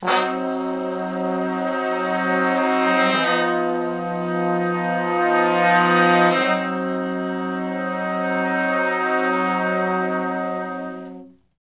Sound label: Slo Blues Chords [I, IV, I, I] (Ab); Sections; Sonic Foundry (Sony Creative Software); Horncraft for R&B